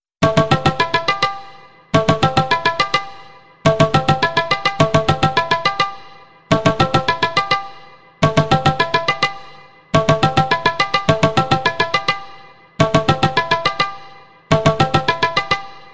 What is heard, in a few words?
tabla drums ringtone